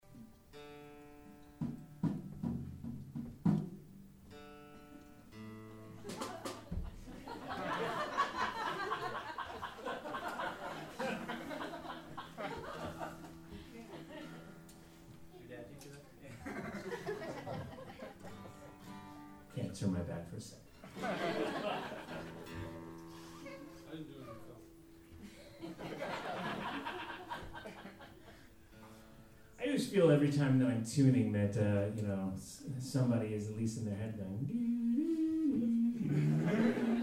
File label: tuning